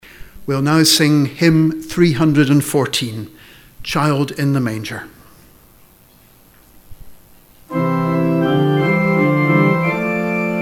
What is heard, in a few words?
hymn; Hymn 314 Child in a manger; St. Mungo's